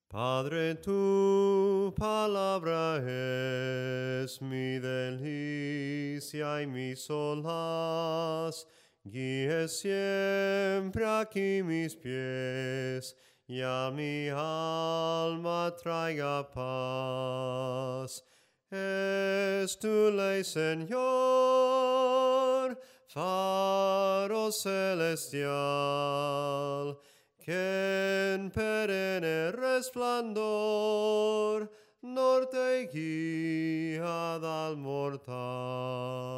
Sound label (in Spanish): SOPRANO CANTADO, himnos